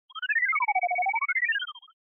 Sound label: Retro Anime System High